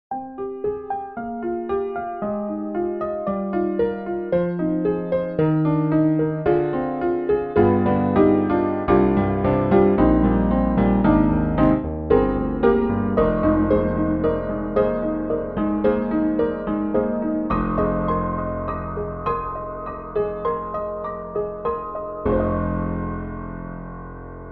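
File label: Broken Piano Antique Piano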